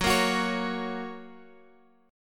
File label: F sharp Major, Guitar, Open F; 18,17,18,20,x,x; strummed